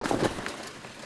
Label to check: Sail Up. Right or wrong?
Right